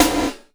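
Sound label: GATE snare drum, effected drum; Crypton - DrumBase5000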